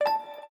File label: combo